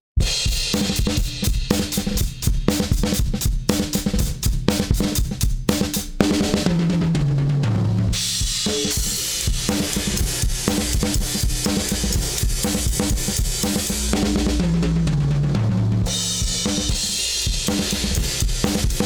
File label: Lindell 7X-500 ANALOG off